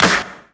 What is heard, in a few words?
CLAP, Dance Drums